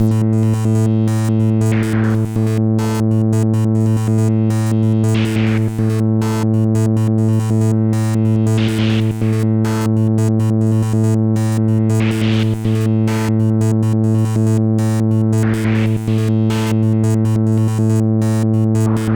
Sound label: SYNTH